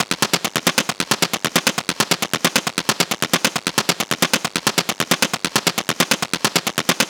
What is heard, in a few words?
Perc Loop 135, Techno; Ghosthack; Techno Sounds 2025